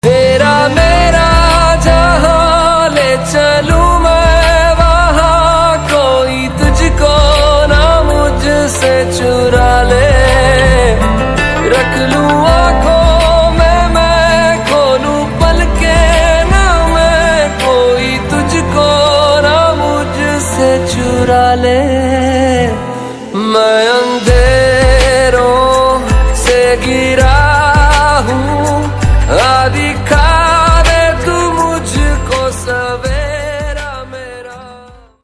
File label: hindi sad ringtone sad love ringtone